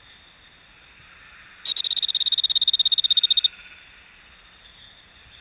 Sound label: Oreophryne banshee